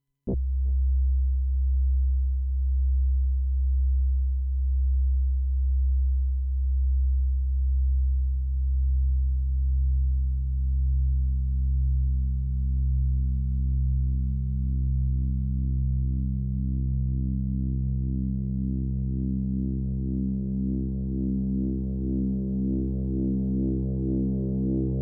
SINE Waveformmod

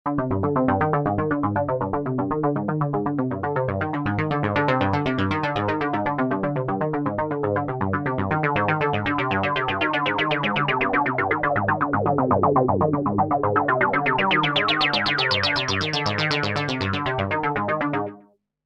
Sequence D

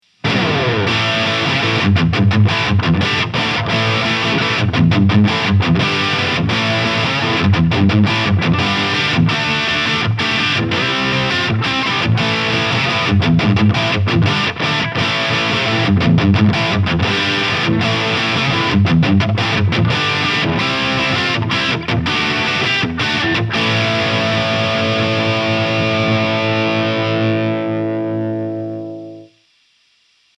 Harley Benton SC 550 II DFB Lead

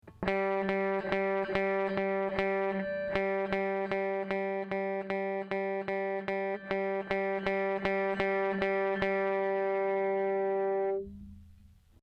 FMR PBC 6A Release Low High Low

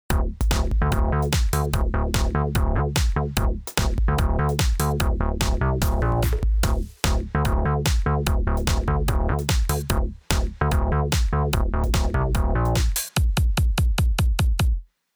Tierra Audio Gravity VCA groove atk rel auto

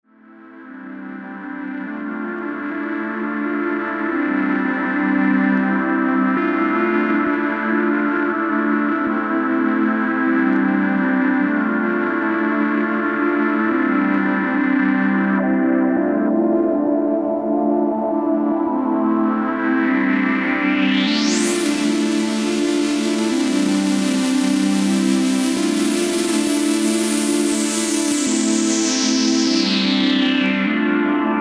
Pad Thing

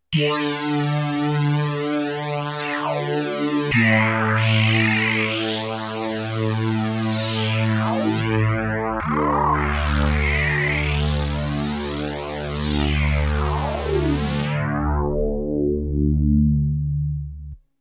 filter

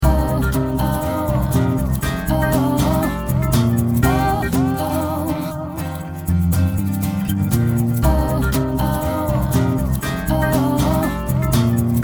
Song w/o Perc

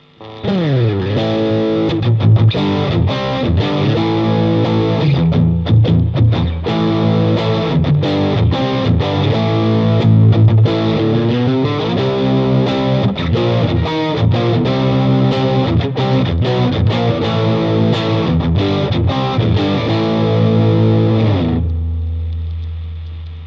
High Gain Bridge

